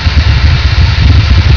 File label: Burning; Deep Fighter